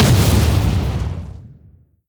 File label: Enemy Army Soldier Bazooka Rocket Explodes